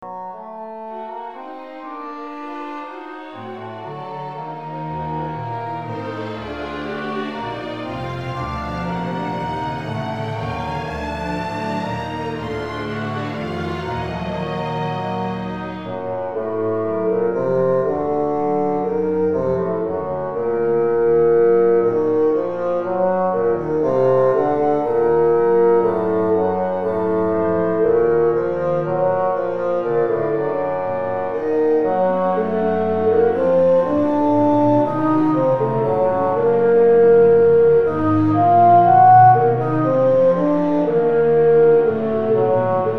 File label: Bassoon; II. Lento